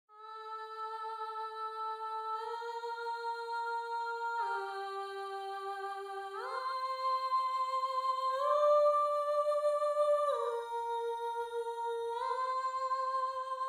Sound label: Alto, counterpoint